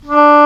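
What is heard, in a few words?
English Horn mf D3; Digital Sound Factory Virtuoso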